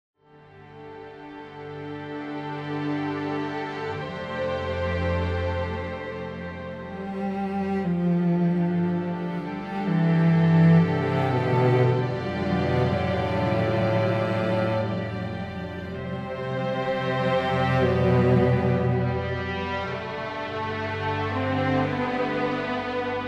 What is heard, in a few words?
Viola